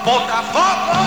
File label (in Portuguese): Vinheta